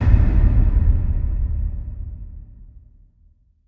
player swap sting, Music sting verb; Doctor Who: The Eternity Clock

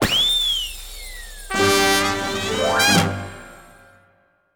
Jingle mini game sheep goal

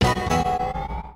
Pop Up Stinger; VaultBoy

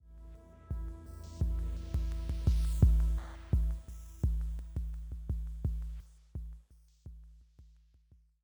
Military High 85bpm; Legends of Pegasus; windows gamerip 2012

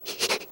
grab vocal; Scrat

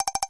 Talk Low

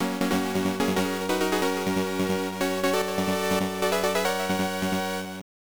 Checkpoint; Road Fighter; MSX; SCC

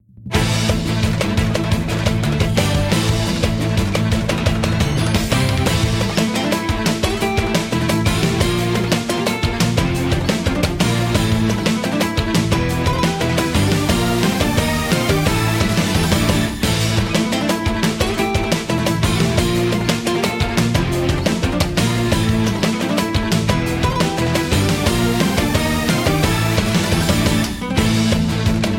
bgm battle; world 9

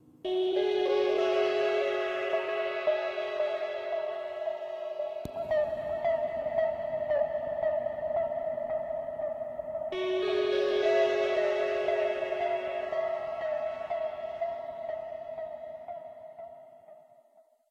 Music Chord Shot; Babbdi

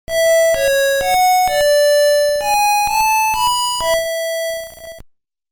ocarina; poseidon's ocarina; Battle of Olympus

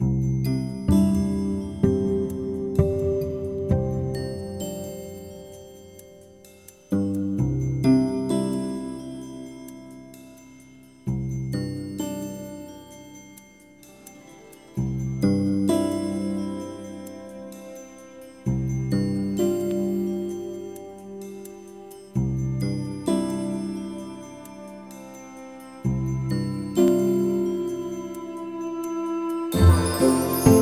Forest Walk; barbie horse adventure wild horse rescue; ps2 xbox

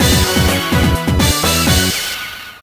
Jingle CLEAR; Alex Rider Stormbreaker

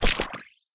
Heal Alcoolgel

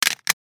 Tablet pickup SD card; Survival

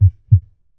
human heart beat

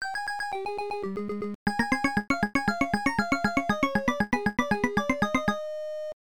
Game Start; Namco Classic Collection Vol. 2; arcade; Namco ND-1

